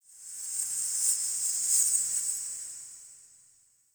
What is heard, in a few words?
Crypt Bell Jingle High A; Ambience; One Offs; Champion Crypt